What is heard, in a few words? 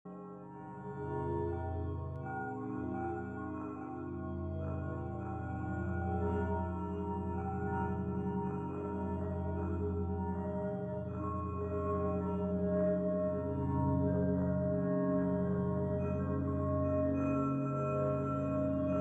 Bells